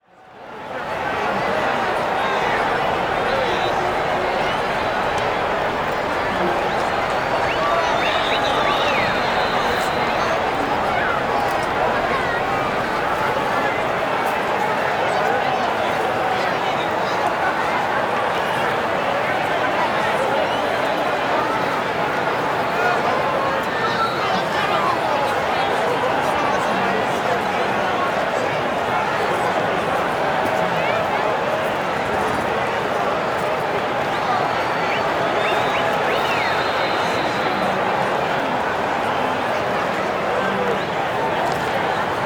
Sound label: Stadium Large Crowd; crowd walla; Sound Ideas; Walla Series